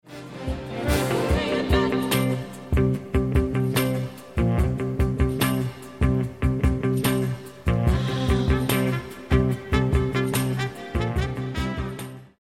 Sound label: Guitar